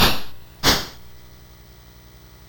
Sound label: BASIC sid MOS6581R4, GAMES; Sword of Fargoal